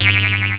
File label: BOING